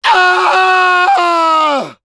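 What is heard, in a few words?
pain critical death, French; Demoman; Hurlement d'agonie 1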